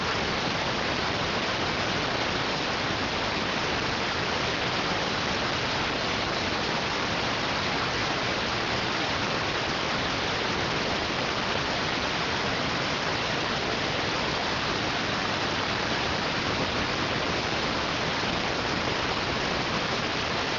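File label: radioteletype receive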